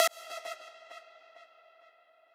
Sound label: lead